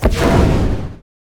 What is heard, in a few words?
attack, weapons; warlock